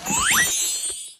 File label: magic reward; run-dorothy